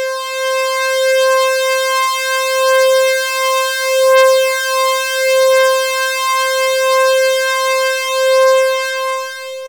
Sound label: Synth, Raw Analog Multi's; Dream Zone